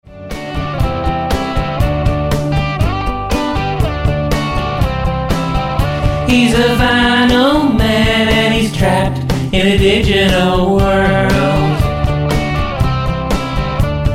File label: band example